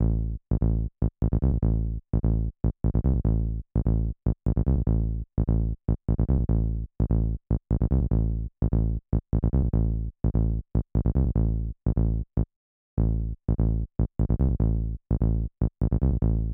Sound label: 303 Bass